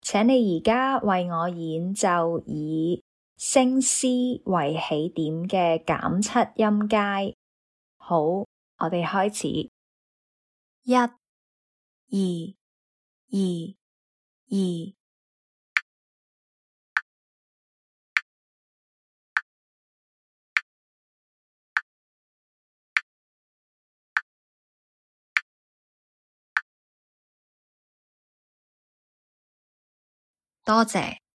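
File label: diminished 7th starting C sharp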